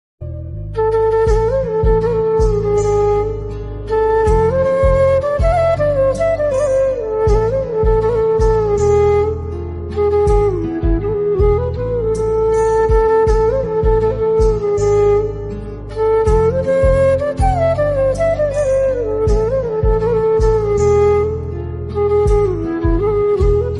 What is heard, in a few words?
instrumental ringtone, flute ringtone